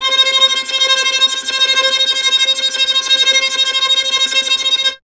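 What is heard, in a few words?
string violin tremolo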